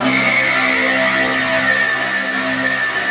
big disto; East West - Global Sounds

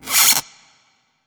scratch wet; Q Up Arts; Denny Jaeger Private Collection

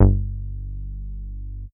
MOOG BASS G2; Best Service Dream Experience

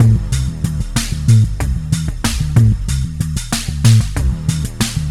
groove tube; FRENETIC FRACTAL GROOVES

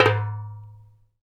DJMB, African Perc; Spectrasonics; Supreme Beats; African Contemporary; SB Afro DRMs

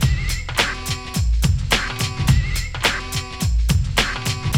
106 gangs; AMG; Now CD-ROM (Roland); BLACKtoBLACK

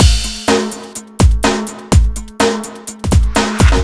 Industrial Waltz Full, Drum Loops 125bpm

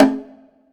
SR L CONGA, Drum Mashines, SR-16 DRUMS; EdgeSounds

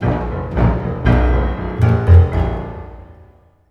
GRO, Orchestral Loops; 128 COAST ROAD

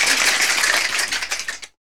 fast, mouth klacks; Roland LCDP14 Africa VOL-2; Foot & Mouth

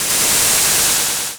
Noise Hit

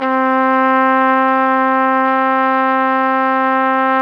Flugelhorn; Roland LCDP12 Solo Brass

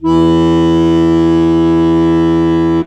HOHNER, akkordeon

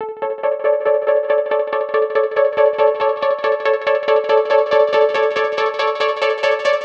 140BPM A; Vengeance - Essential Clubsounds Vol.4

